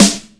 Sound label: smooth steel snare drum sample g key